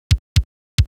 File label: Kick; big fish audio; Hip Hop Exotica; Apple Loops